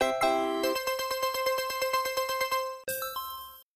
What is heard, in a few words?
game win